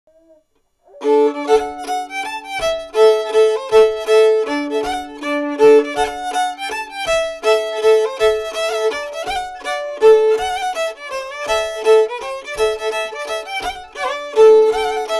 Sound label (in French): Mazurka; Mazurka de Siccard